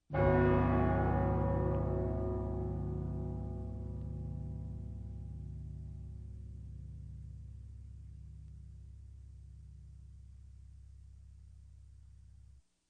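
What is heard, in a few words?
clock striking; Boy chasov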